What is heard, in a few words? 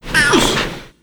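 Npc peaky turtle damage; Fly For Insanity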